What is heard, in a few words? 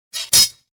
CLING; MOBICLIC150